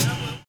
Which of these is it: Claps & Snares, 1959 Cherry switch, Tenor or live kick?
live kick